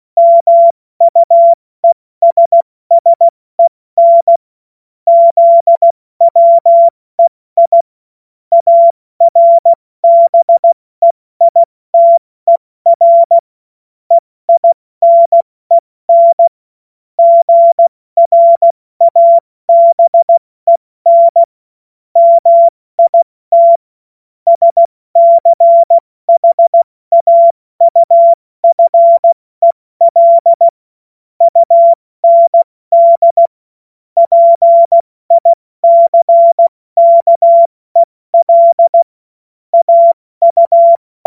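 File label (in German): Witz, cw morsen; Morsekurs; Witze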